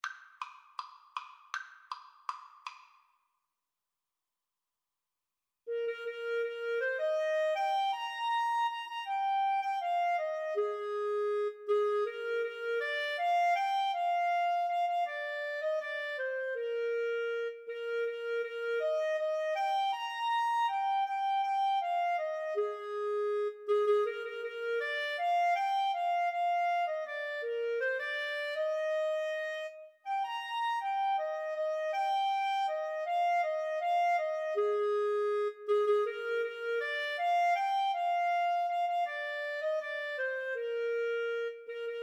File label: wabash, Clarinet-Saxophone Duet